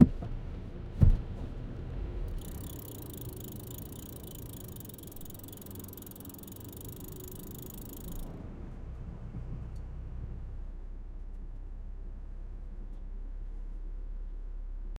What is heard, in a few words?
driving in bike and sudde